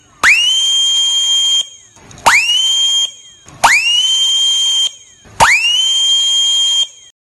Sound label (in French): son klaxon sifflet turc